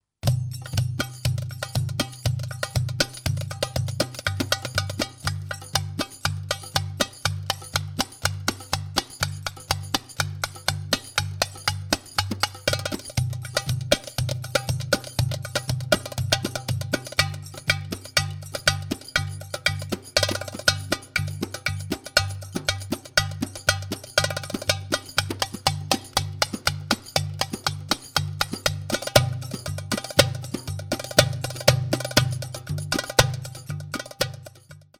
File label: Oriental Solo Tabla Drums for Training